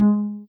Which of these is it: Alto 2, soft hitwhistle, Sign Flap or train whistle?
soft hitwhistle